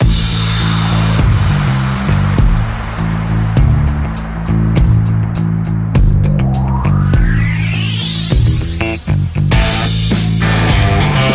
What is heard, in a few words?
Bed Rock